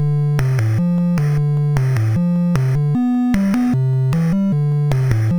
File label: sid MOS6581R4; Avoid the Noid